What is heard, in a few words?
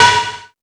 Crash 2, drum machine